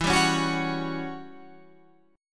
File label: E Minor 6th Add 9th chord